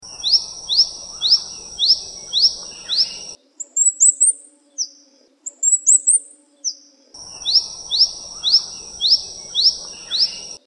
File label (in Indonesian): suara call burung madu sumba